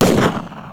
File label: gunshot; singe